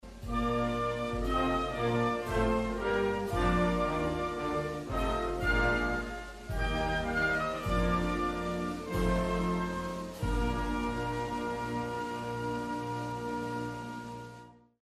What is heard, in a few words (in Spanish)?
musica tradicional inglaterra